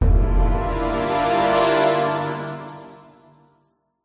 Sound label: musical orchestral hit horns crescendo; zapsplat